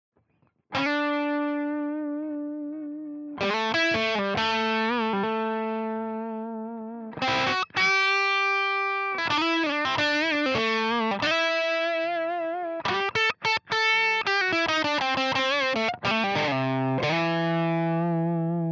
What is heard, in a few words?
floyd rose